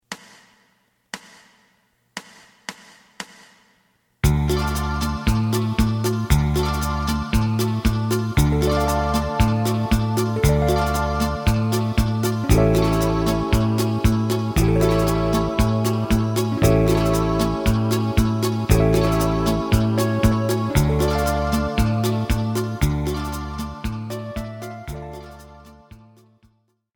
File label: lead